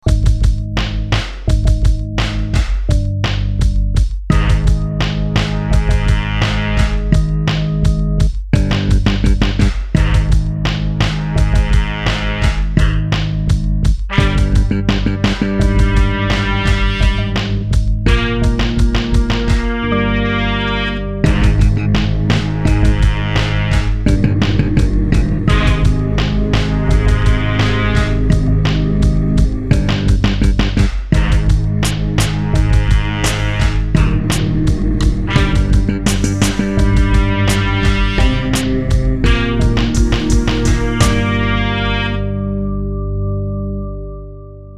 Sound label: hiphiphop